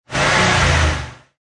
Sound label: Truck Ford F150 Rev Engine Perspective; Auto, truck, motor. Ford F150 Truck. Rev engine one time, engine perspective.; Car Sound Effects